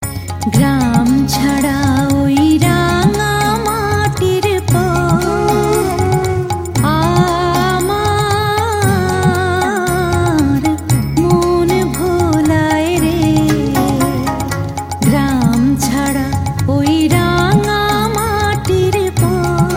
Bengali Ringtone

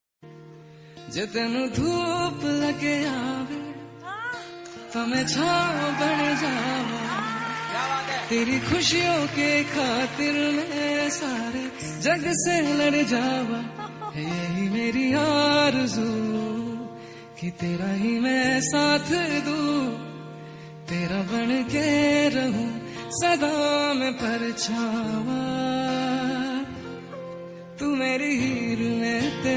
Romantic Hindi Ringtone

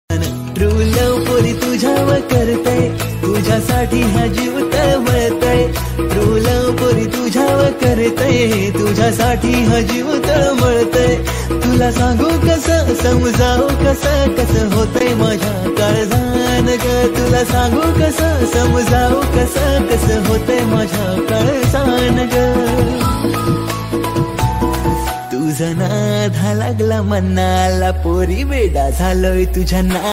Marathi Song Ringtone

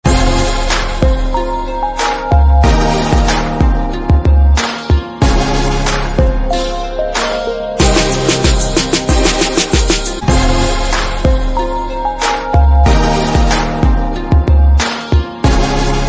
Music Ringtone For iPhone; ringtones

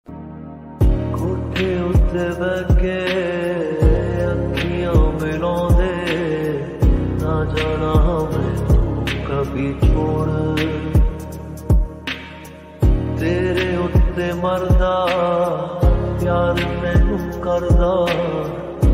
Sad Ringtone

